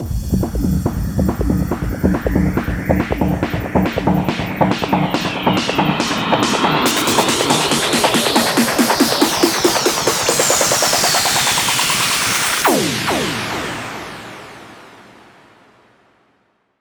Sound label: 140BPM 8Bars, HandsUp Trance Fills; Warp; Vengeance Samples